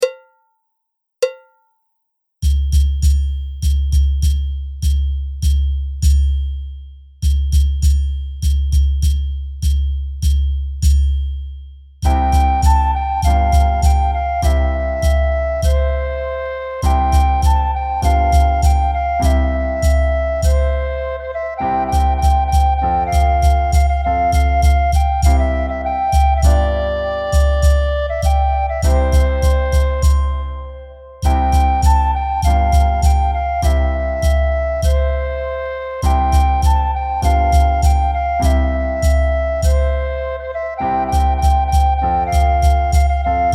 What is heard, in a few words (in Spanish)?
tengo una vaca lechera con percusion